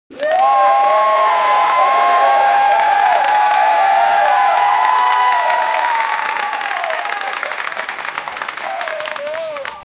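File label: camera shutter crowd cheering; photography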